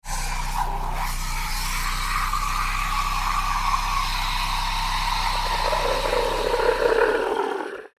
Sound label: Milk frother milk bubbling; appliances